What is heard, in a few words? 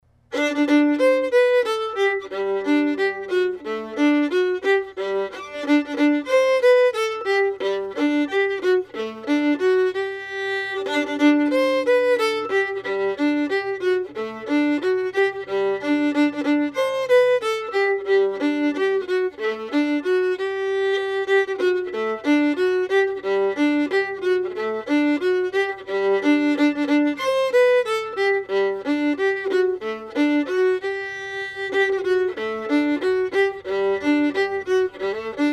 Spanish Galopade slow, fiddle